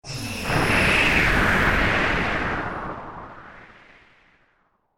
Incoming Missile Futuristic High Pitch Whistle Approaching Quickly; SciFi Warfare; Battle; Missile Launch